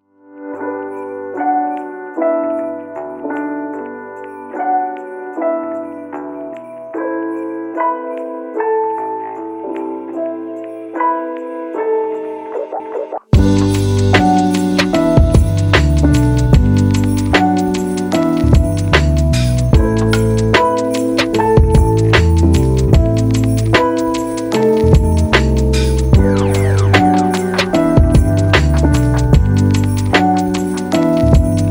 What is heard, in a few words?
chill christmas vibes